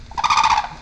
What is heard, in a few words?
Grus japonensis alarm